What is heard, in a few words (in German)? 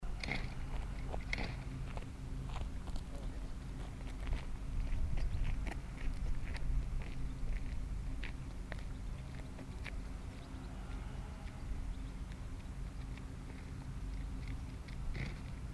marder